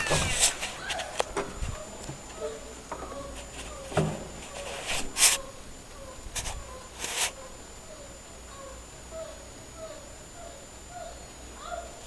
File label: noisy neighbours